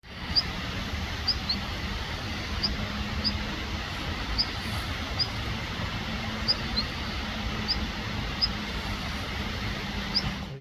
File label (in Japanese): benimasiko, bird song